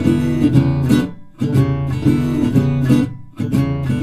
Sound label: Blues Guitar, riff; ILIO; Fretworks; Blues Guitar Samples; SIMMS RIFF